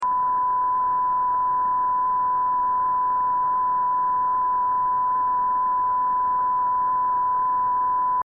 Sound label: Polarization Modulation LinHorz + LinVert; Light Rock